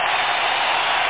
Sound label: crowd; ultimate manager